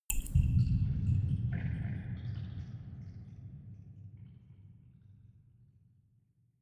dripping; atmosphaeren

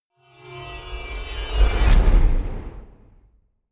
sci-fi vehicle metallic departure; raumschiff

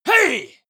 male combat attack short

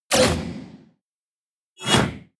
beam

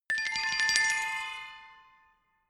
glockenspiel mysterious tremolo low octave short; percussion

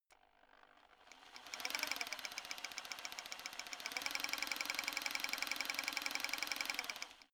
rock drill chisel long settled run; maschinen; schrauben drehen hydraulik

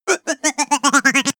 giggle tube sequence

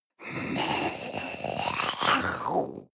zombie female throat; kreaturen

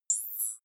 zoom in

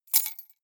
key ring large drop stone soft; objekte; schluessel

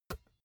basketball catch finger tips

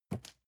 cardboard box medium grab soft; objekte

